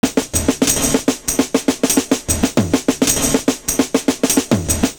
ROLL, LOOP

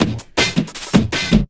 Drum & Bass, 160 BPM

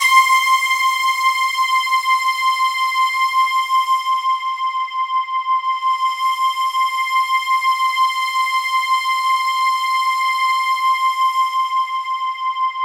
C5, SynthLine; 90 s Sample CDs; NiceBeats; SynthLine VOL-11

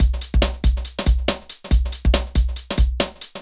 Synth Loop BPM140

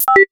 BINGO BELL; Crypton - DrumBase5000

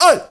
MALE SOLO, MALE NOISE, Heart of Africa; Spectrasonics; Heart of Africa 1

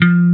ROCK BASS F2; E-MU Formula 4000 Series Vol. 5; Protozoa; Proteus1 Presets

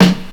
Snare, Drum&Bass

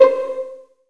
PIZZ C3; STR

